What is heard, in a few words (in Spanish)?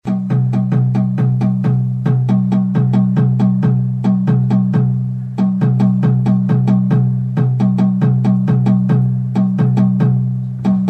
ritmo sextina corto; Teatro